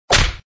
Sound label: hit; marvel; city under attack